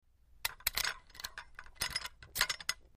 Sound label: Locking A Steering Wheel Lock; The Club Lock